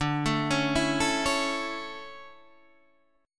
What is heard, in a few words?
D Major 7th Suspended 2nd, Guitar Standard; arpeggiated; JGuitar